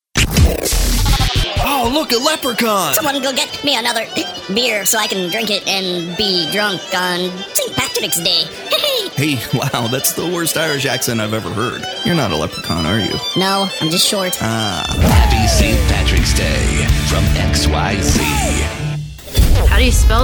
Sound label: classic rock splat sample